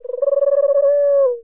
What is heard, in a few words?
coo; Animal Sounds; Various Animals; Short calls